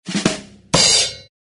short drum flourish with cymbal

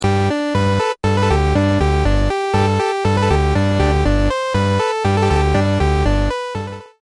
next level achieved

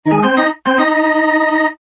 music charge organ chant

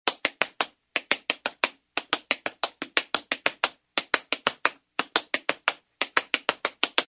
tap dance soft shoe

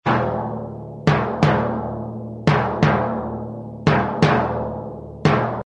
timpani drum rhythm low

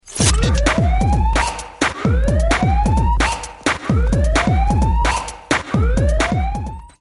Hip Hop Alarm Ringtones

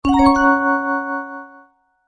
Arpeggios Glisses

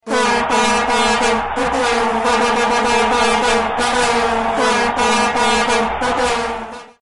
Vuvuzela

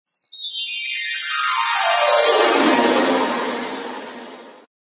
magic gliss

